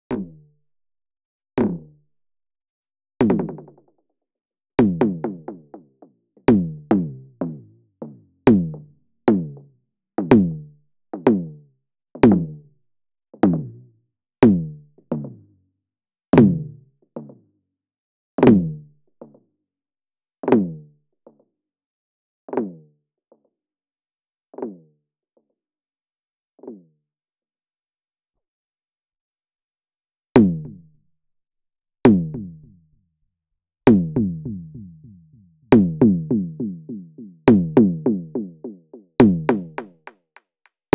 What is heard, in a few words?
Elektron Digitakt Delay